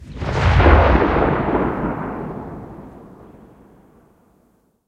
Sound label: cannon 37mm kwk36 tail far